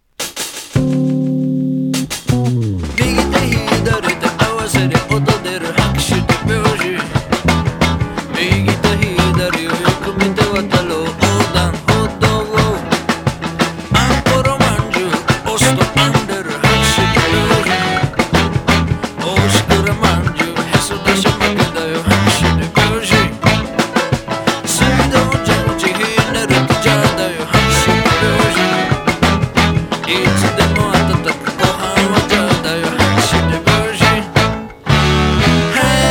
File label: HAND CLAPPING RHUMBA